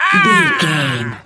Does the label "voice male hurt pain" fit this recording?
Yes